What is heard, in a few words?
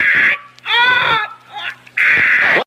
scream